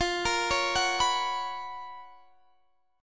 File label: Gb Major 11th